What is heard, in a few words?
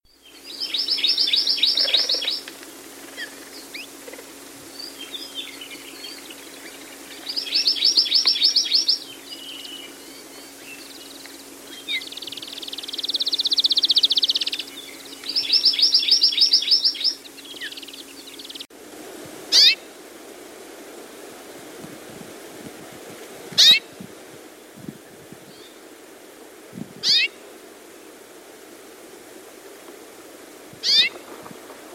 Amakihi